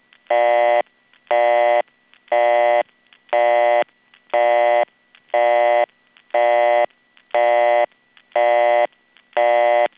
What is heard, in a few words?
busy